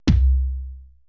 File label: PERC MIX